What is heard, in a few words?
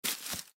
Footsteps Hard Sole Shoes Single Step Right On Leaves; Foley; Footstep Sound Effects